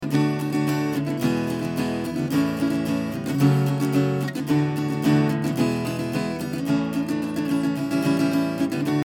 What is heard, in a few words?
Guitar EQ